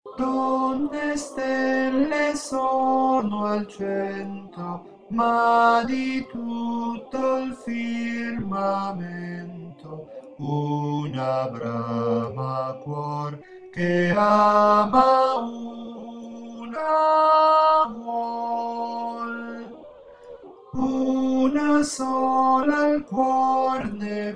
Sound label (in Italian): operetta sop; operetta 01 soprani; Viva l'Operetta